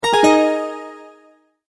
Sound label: multimedia tone end